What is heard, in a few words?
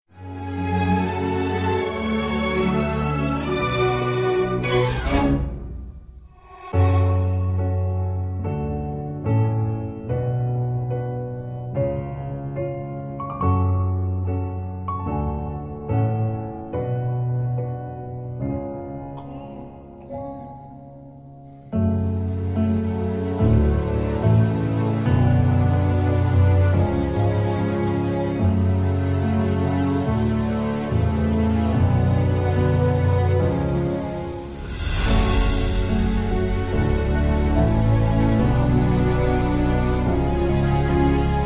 Karaoke Version; Eurovision 2022 - Belgium